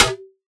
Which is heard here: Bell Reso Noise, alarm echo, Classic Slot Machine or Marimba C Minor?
Bell Reso Noise